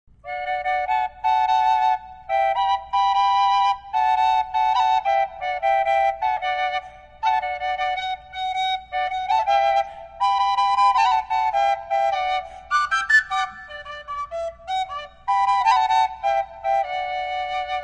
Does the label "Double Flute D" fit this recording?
Yes